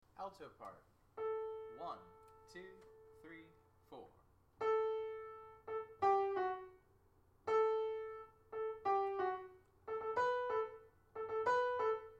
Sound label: Hall Alto, hallelujah chorus